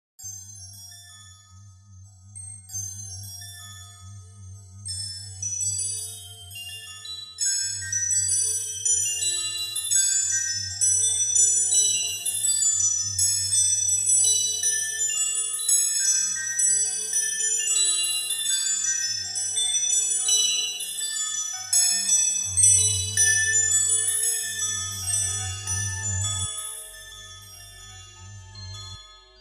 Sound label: heartbells